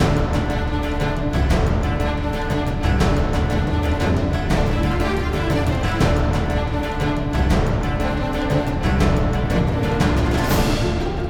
Gameplay Heavy; crush your enemies

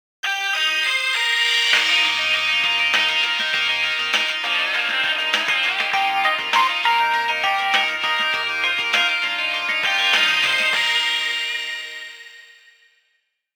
Radio Jingle (Morning); Animal Crossing New Horizons

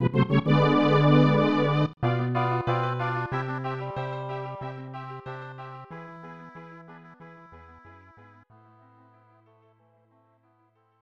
Tada; Heisei Shin Onigashima; snes

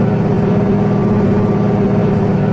Engine Loop

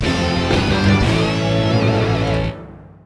New Unlock (Jingle); mario tennis open

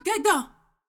Dragon Lady Victory Vo; Android; Portal Quest

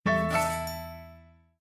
Festival Logon Sound, windows 7

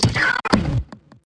down death

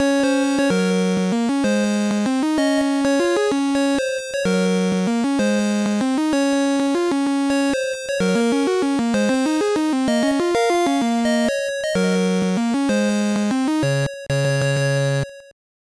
BGM; baseball; game boy

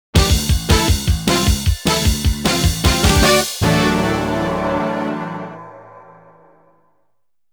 Victory; World Heroes Perfect; Saturn

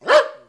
Dog Idle; In the Line of Duty Firefighter

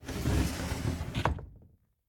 Door Slide Open; The Inn

